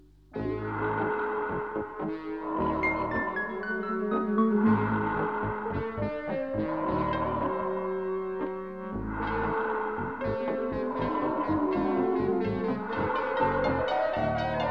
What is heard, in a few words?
Heavy Breathing